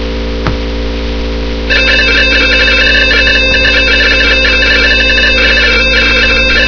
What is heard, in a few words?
home made artificial procedural music generator; demonstration